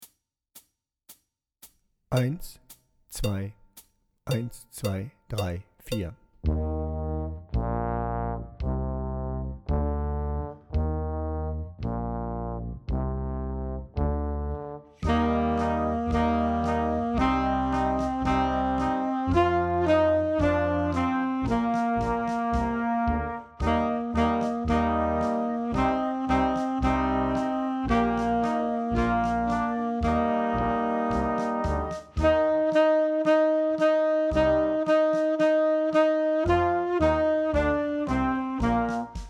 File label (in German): March Tenorsaxophon lauter